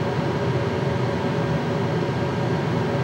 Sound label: amb baggage escalator, ambient atmosphere